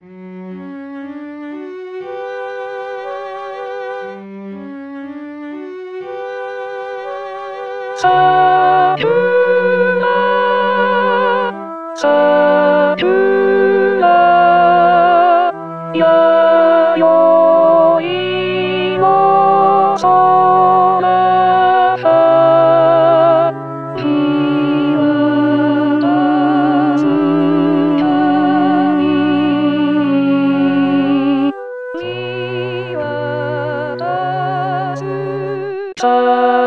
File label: alto